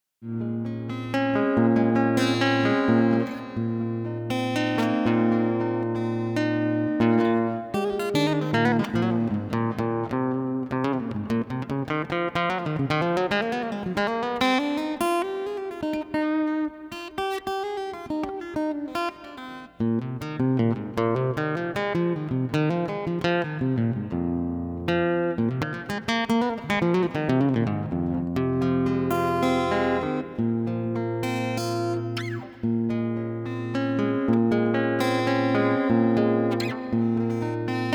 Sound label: Guitar Stuff Lo